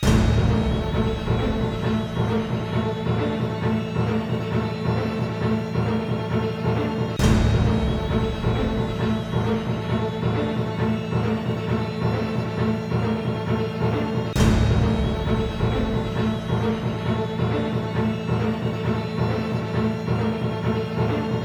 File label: BATTLE NORMAL LOOP Tension Rising 134bpm; Cinematic Normal & Boss Battles; SAGA Complete Collection v2.0; SAGA III - Cinematic Normal & Boss Battles